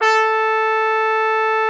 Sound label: A4, TRUMPET S; Xsample VOL-3